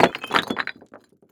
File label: brick fall